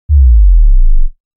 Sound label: 80 Hz- 20 Hz Bomb Sweep; 808